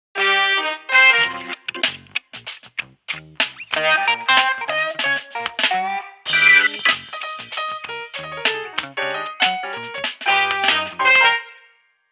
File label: Radio Jingle (Evening); Animal Crossing New Horizons